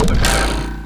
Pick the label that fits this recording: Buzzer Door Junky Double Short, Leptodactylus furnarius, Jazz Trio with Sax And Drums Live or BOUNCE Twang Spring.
BOUNCE Twang Spring